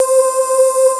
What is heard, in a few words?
VOX C5; Big Fish Audio; Synth City